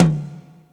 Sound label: wet tom e key, Royality free tom one shot tuned to the E note. Loudest frequency: 709Hz; tom drum samples